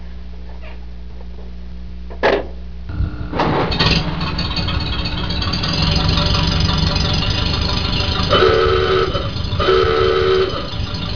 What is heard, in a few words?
startup and ahooga, Model A